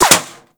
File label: Bullet Impact Metal